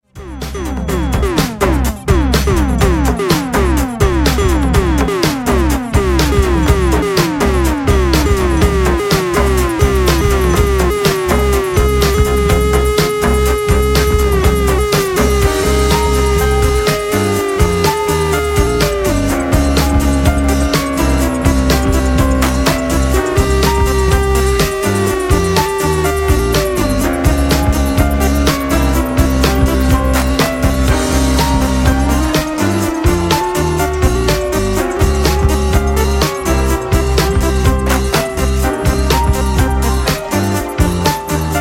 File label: instrumental